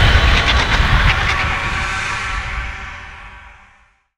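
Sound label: gun door off, weapons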